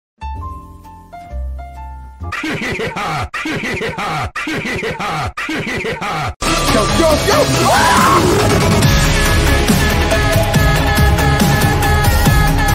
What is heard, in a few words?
First Trumpet